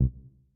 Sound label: btn hover